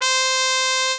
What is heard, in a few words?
mexican trumpet